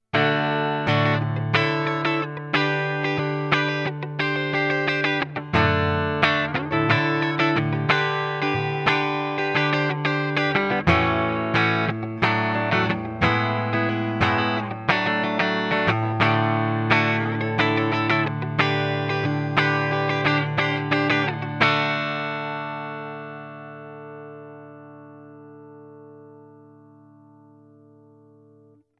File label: Hagstrom Ultra Max Neck Clean Strumming